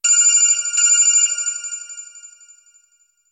Sony Xperia Z

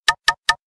Knock

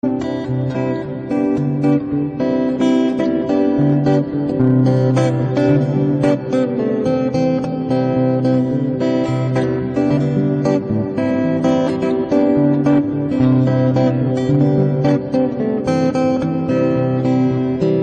Guitar Tone; 3 idiots

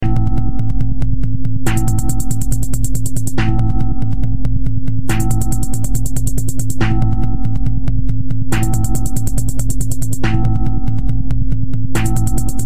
Tension Music

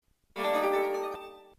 Text Tone

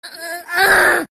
Fire alarm; meme

